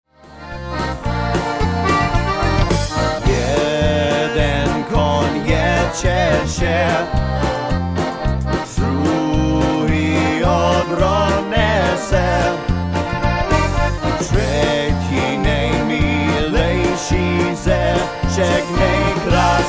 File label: Polka